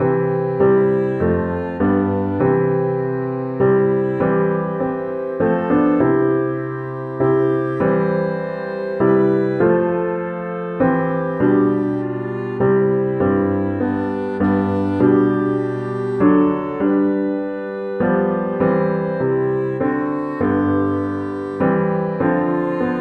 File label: rockingham, clarinet